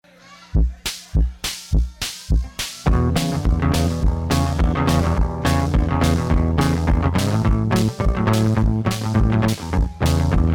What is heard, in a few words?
Guitar Improv live